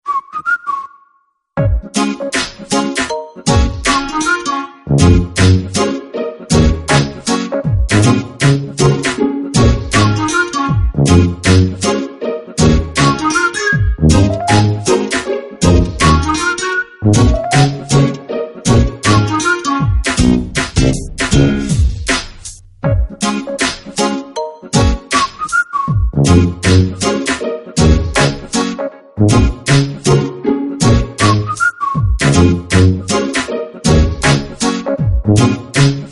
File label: PFEIFINGER TUBA hiphop; NEBRASKA